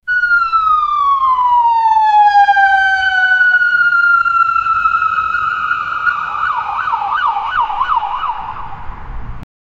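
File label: uk ambulance siren two tone; emergency vehicle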